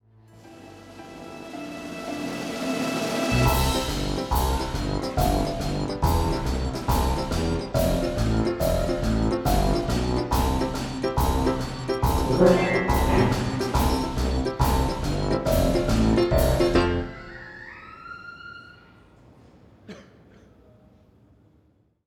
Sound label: (Instrumental); My Little Pony OST Repository; Season 1; Bonus Music